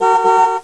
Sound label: CAR HORN